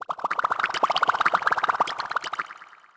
Bubbles; Scrolling Bubbles; lego my style preschool

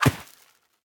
Harpoon Hit Ground

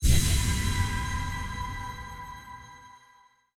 dream enter; Hollow Knight

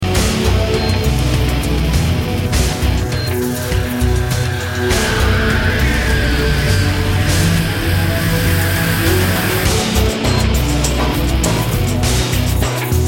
menu music win

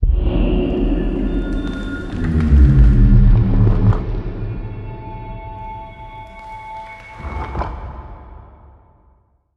Jingles

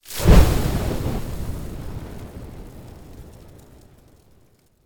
Arrow Launch Fire; Timelock VR

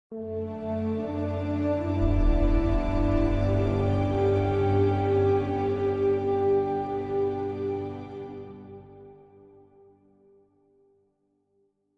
Location Jingle New Region; theHunter: Call of the Wild

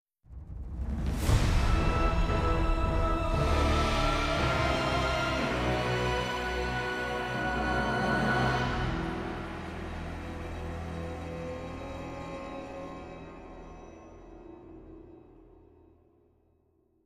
Boss Fight Death; Troy Musou; PS3

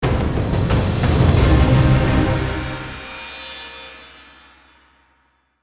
Combat Stinger

